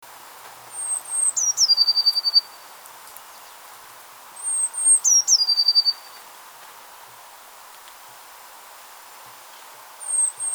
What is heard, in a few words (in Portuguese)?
Chapim azul